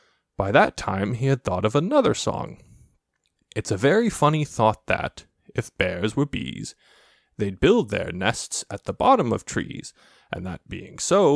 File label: song